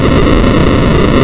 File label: fire